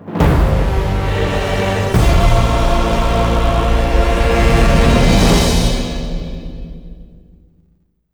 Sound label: Sting